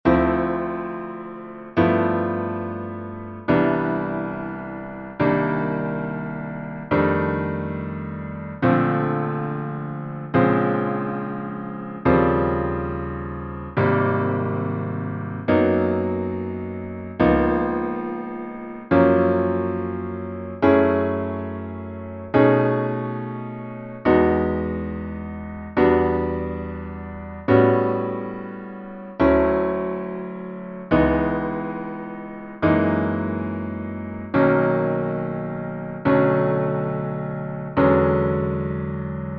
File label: Voicings Jazz 9ths ii V Is